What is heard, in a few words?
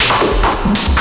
SPACE SD; BEAT2000